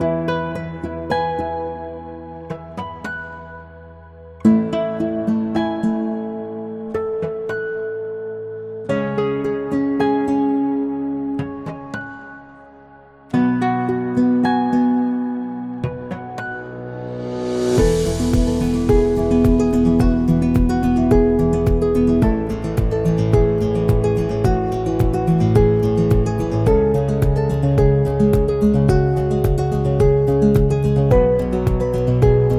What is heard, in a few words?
Acoustic Guitar